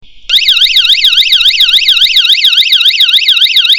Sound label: Siren Sound